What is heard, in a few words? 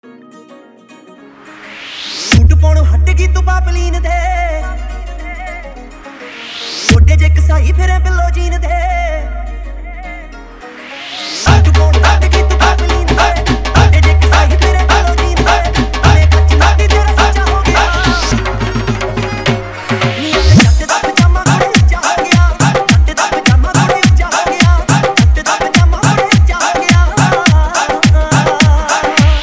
Punjabi Song Mix